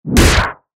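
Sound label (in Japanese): 槍 通常攻撃, 武器効果音; モノラル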